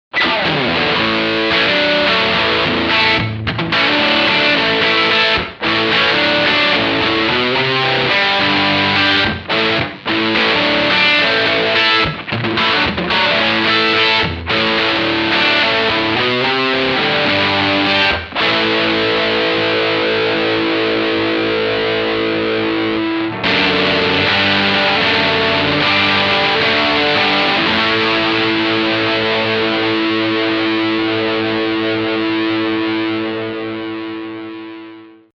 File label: Booster Distortion